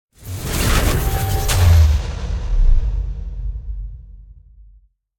sub sonic bass; sonniss gdc

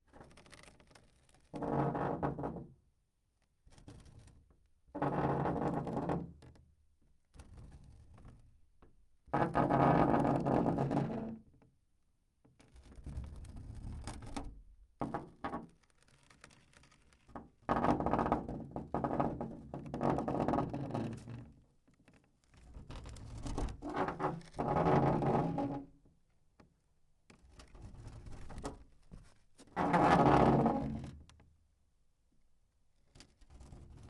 metal creak van door back and forth various; fvtt sonniss gdc audio

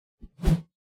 melee attack swoosh heavy; weapon swooshes; psfx

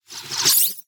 tiny transition; sonniss gdc

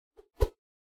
melee attack swoosh light; weapon swooshes; psfx

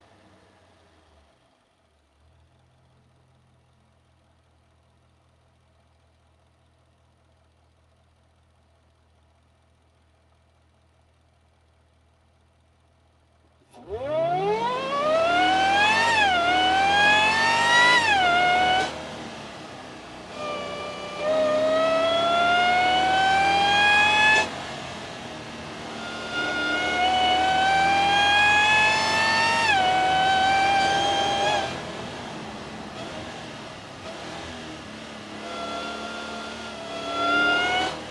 onboards airbox; fvtt sonniss gdc audio

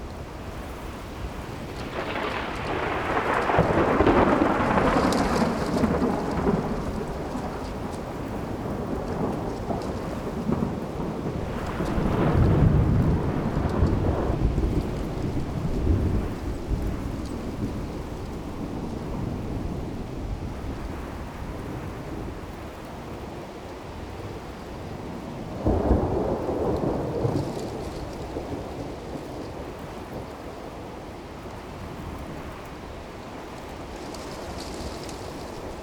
plains distant thunder loop, ambiences; Michael Ghelfi; RPG Ambiences Vol. 7 (Short loops)

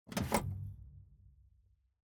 lifting metal tackle box from metal bucket; sonniss gdc

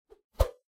meleeattack swoosh light, weapon swooshes; psfx

